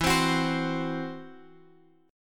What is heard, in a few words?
E Suspended 2nd Sharp 5th E, Guitar, Open F; strummed; 16,19,18,19,x,x; JGuitar